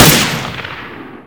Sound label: scar unsil, weapons; garrysmod